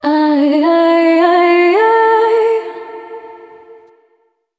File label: Vocal Uhhha D